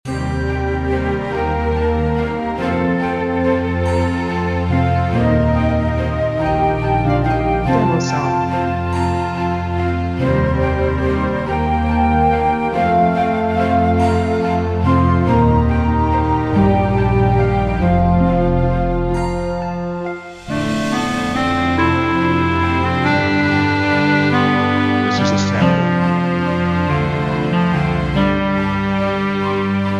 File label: Dreamy Fairy Tale, Loop Static Tempo